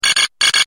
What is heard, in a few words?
Loud Tone